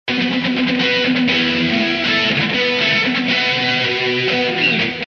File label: 120 rhythm